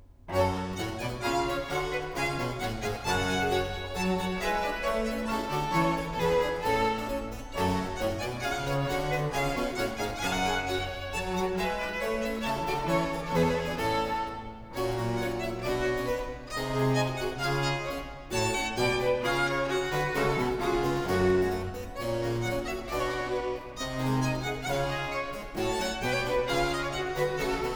Trio Sonata for 2 violins & continuo in G minor, Gavotta